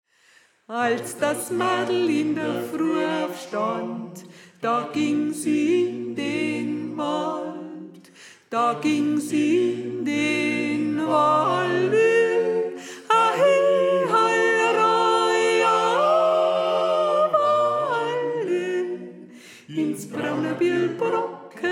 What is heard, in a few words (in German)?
Sopran